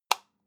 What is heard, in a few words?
light switch off hard